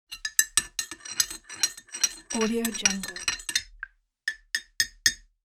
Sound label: coffee stir